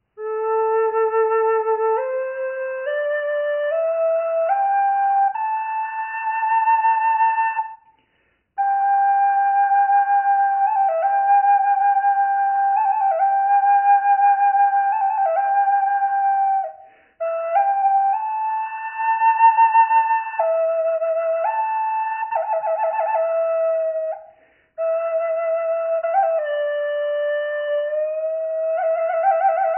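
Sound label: reverb, flute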